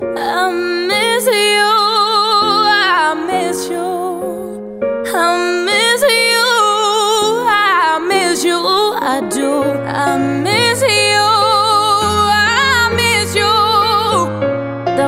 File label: New Hollywood Ringtone